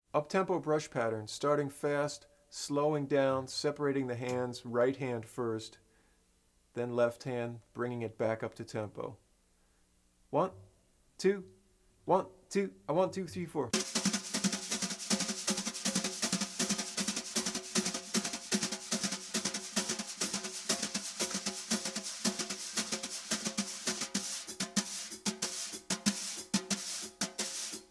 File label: Uptempo, Bop Drumming; The Art Of Bop Drumming; Riley